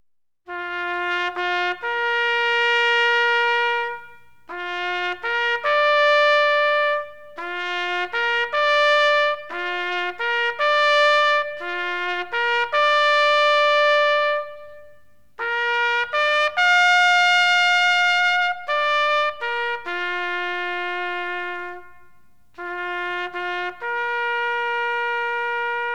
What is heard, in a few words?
Bright Military Taps Last Post Call; Korean War Tribute Video